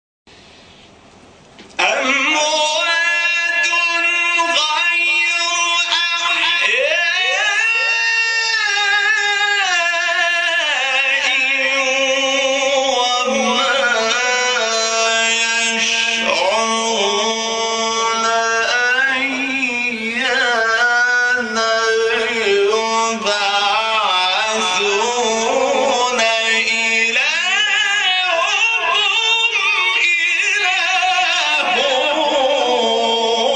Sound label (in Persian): تلاوت آیه سوره; 21 22; نحل; حمید شاکرنژاد